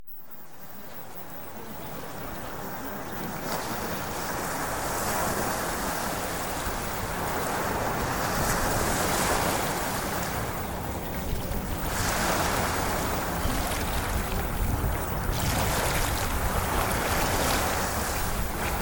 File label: Ocean Waves; Premium Subliminals; Emotional Mastery